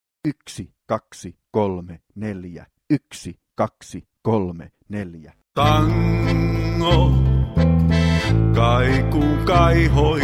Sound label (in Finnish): alkulasku laulutango ilman rumpuja